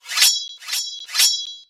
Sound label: sword